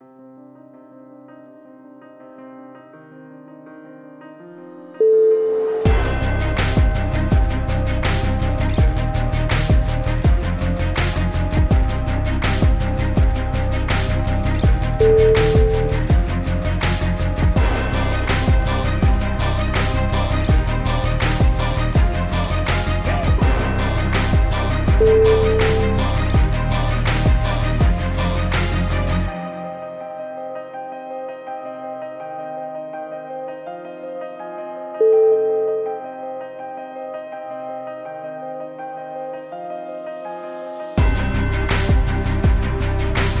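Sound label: epic heroes in hip hop, music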